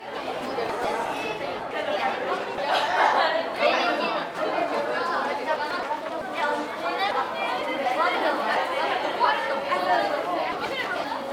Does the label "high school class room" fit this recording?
Yes